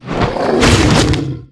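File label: King Call Attack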